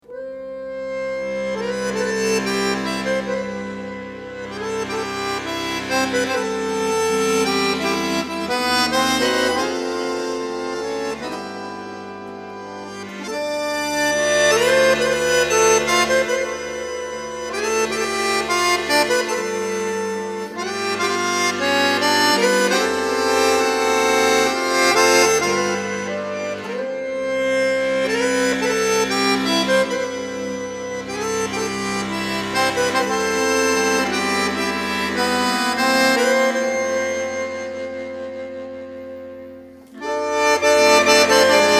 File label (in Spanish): acordeon